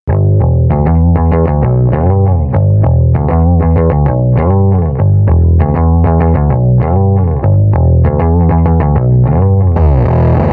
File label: clean boost then fuzz, pedals; bass bully